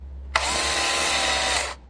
Electro FX; Ueb HE Electro FX

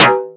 fm clonk, Electronic Hits

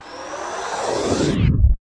Racked fx; drums; hip hop; STYLE KITS (Hip Hop, Etc); Khezie Hip Hop Kit

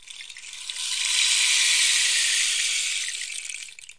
rainstick performance; ETHNIC and WORLD PERCUSSION; Rainsticks

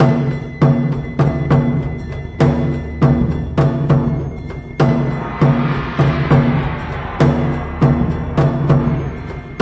100 japan journey, DRUM LOOPS and BREAKS; 091 to 100 bpm

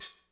classic hip hop Vrb H; STYLE KITS (Hip Hop, Etc); Classic Hip Hop Mega Kit

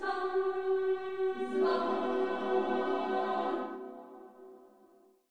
Slavic Choir F sharp 5th Zomm

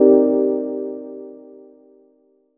stab satisfying

